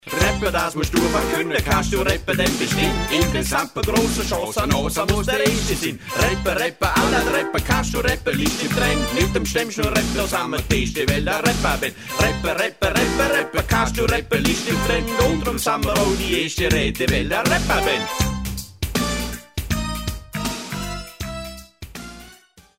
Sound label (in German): rap